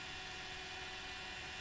Moving-Reverse Loop

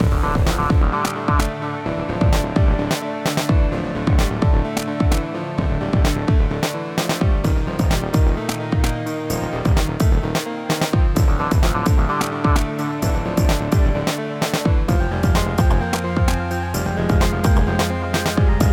Forest Battle Loop

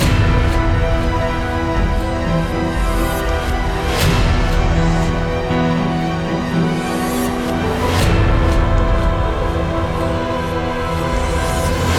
Battle

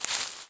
Grass footstep; starbites

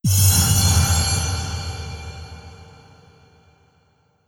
Medieval UI Chime

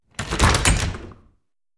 Doors Closing

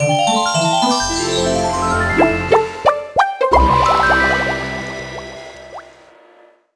LOGO; MonsterBath; Deathwish Enforcers

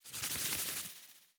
Ui Map Update

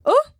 Startle

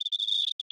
Env Void Cicada 3 Singles

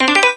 Sfx Darts

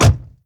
Car Door Close; Enemy Generic Car Door Close

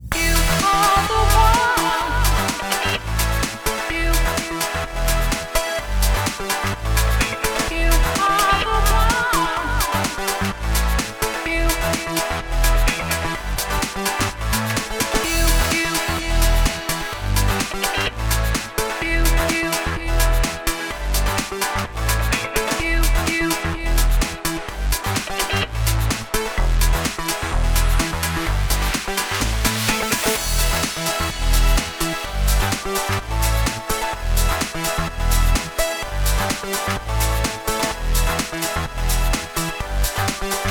chorus Loop 2 128 beats; LaRochelle

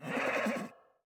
Deer Cry

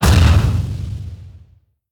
Monster Foley Fall Floor; Monster Generic Foley Fall Floor; gamerip

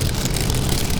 Bee Soldier Drill Drilling Loop

